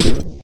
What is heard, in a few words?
landing